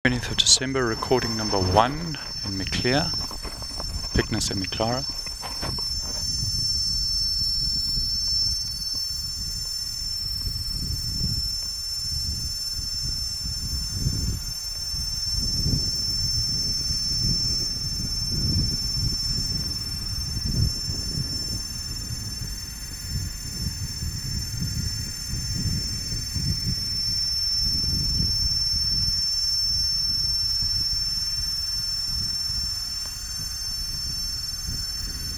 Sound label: P.semiclara Woodcliffe Farm